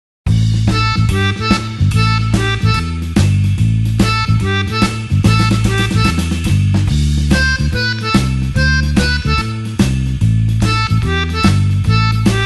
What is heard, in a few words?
blues harp shuffle A